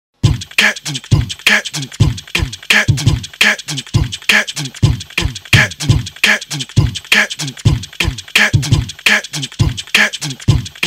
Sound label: Beatbox, Jungle